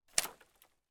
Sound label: impact tree branch small land on other branches on forest floor